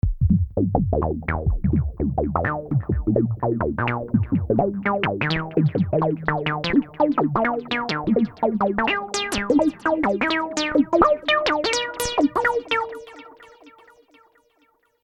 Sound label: Sequence H